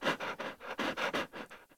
Puppy Idle Pant; Vocals; Warframe; Kubrow

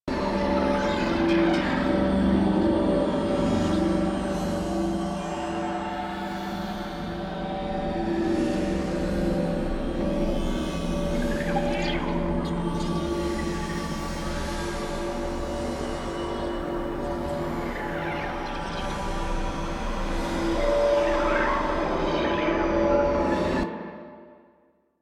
Landscape Interior Loop; Warframe; Venus Open World; Corpus Interior

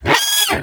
Snow Critter Vocal Pain; Hunting Critters

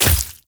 Short Sword Medium Impact Flesh; Weapon Impacts; Player; Swords; Combat

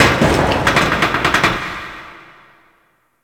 Bang On Door; Rescue Hostage; Warframe

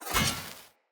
Sword Whoosh Accent; Weapons; Swords; Fenn Jotar Sword

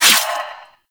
Shadow Melee Swipe; Warframe; Duviri

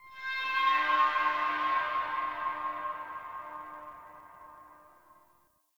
Distant Metallic Groan; Warframe; Tau

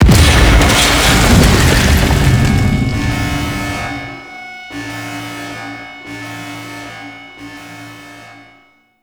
Invasion; Warframe; Jade Shadows

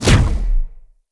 Staff Heavy Proj Impact Player; Weapons; Enemy; Staves; Mnd Wazard Staff

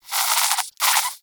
Rodent Vocal Snore

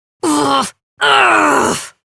Exertion, Adult; Warframe; Adult Operator; Dialog Barks

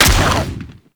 Inf Vomit Gun Frenzied Shot; Infested; Weapons

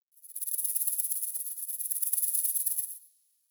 Forest Creature Rattle High; Warframe; Grineer Forest

